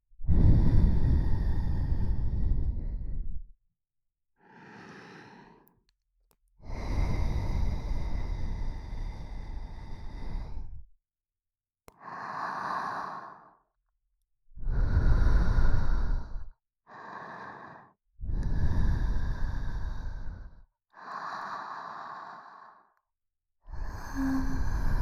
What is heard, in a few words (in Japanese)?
ふ～っ…♪, ASMR, 右耳